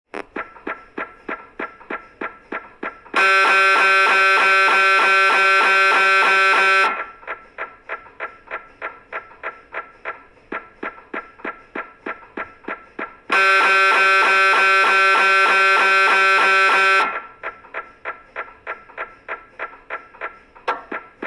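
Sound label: sounds of MRI tomography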